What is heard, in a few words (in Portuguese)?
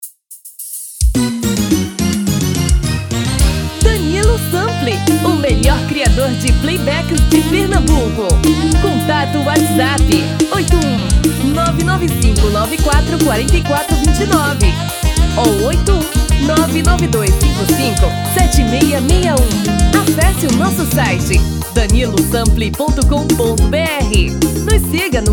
Forro das antigas tres tom abaixo